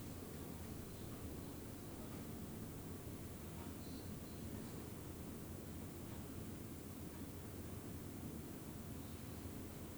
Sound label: full gain; SD633; RodeNT4